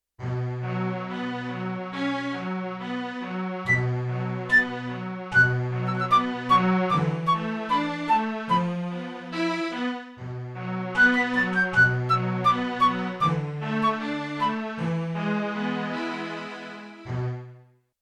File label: Strings-Flute-Split; Yamaha TX16W; Orchestral Performance 1